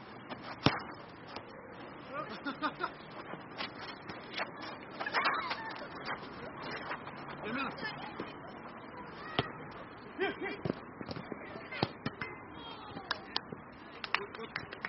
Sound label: Park Football